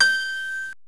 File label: FNGR GLAS